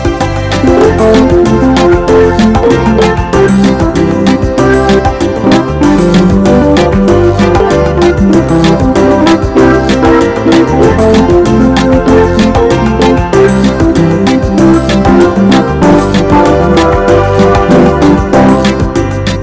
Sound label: Great Dancing Music